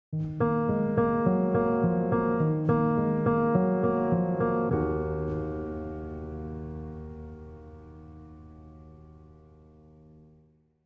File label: Heartbreak Sting